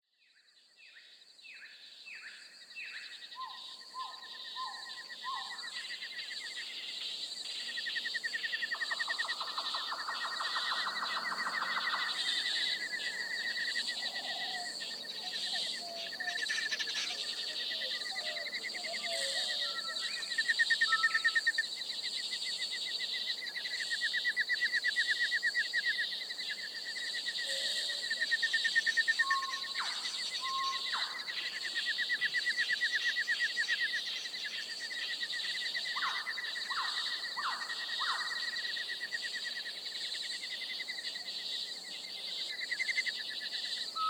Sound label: jungle, ambience; ambient; minecraft; Remastered